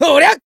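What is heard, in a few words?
attack, Japanese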